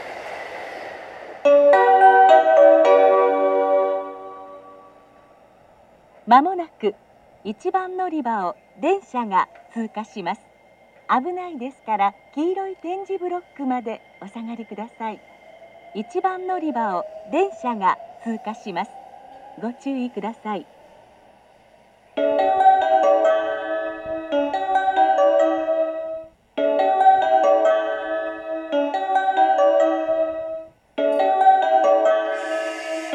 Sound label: pass, station; jr-west